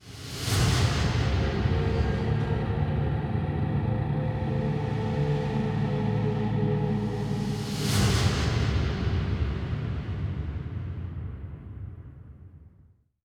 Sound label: Intro 130